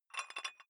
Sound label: dumbbell thread take off small weight gentle, fitnessstudio